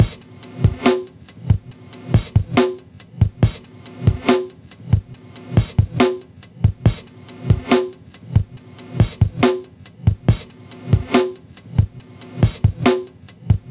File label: Live Breaks, 70